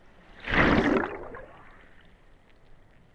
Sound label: Wave Hydrophone